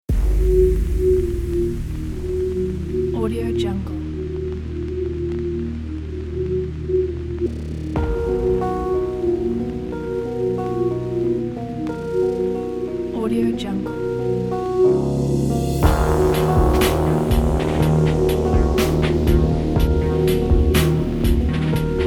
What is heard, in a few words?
dark ambient intro